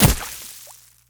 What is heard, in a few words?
blade water